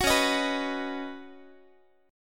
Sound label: C sharp 9th Suspended 4th C sharp down Guitar, chord; C#9sus4 strummed; 21,21,18,21,x,x; JGuitar